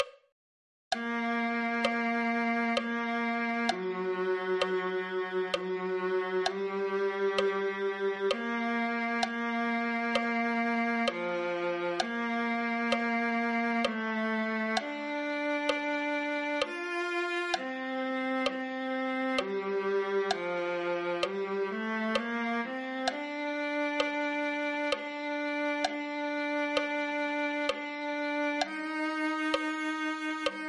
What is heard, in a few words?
viola